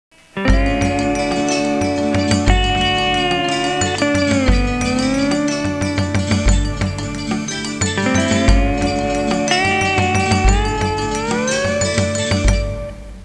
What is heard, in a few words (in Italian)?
suonerie musica hawaiian